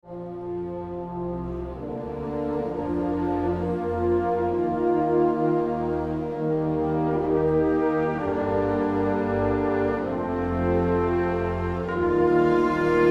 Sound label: Victory Menu Loop; Call of Duty Roads to Victory; PSP gamerip 2007